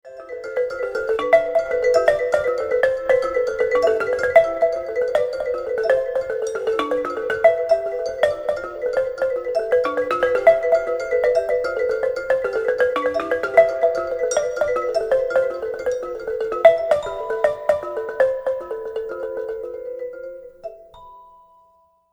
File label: Balafon